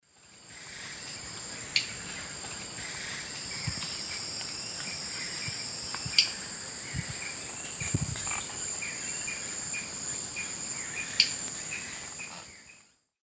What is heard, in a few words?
Cnipodectes subbrunneus, bill snaps; S. America; Eastern Lowlands